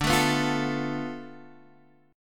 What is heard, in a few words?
D-Minor 6th, D, Guitar, Standard; Dm6 strummed; JGuitar